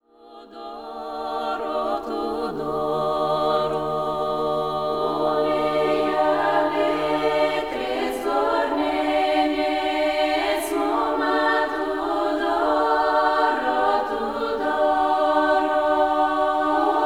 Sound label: female choir; love song